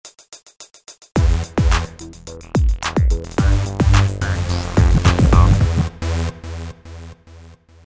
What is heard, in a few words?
startup